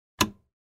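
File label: najatie knopki na provodnom pulte tv, pressing a button on a wired TV remote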